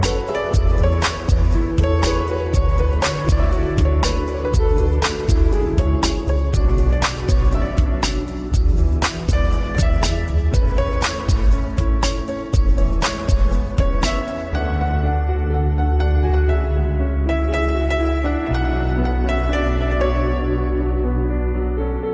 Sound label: instrumental ringtone